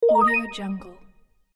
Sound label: bright positive retro video game confirmation menu sound